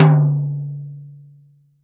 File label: FT1 MF, TOM FUS